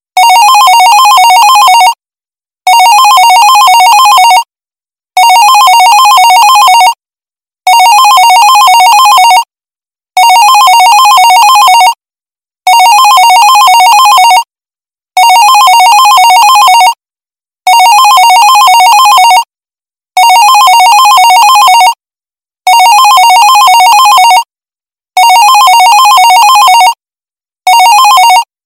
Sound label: Office Phone